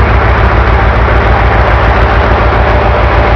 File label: run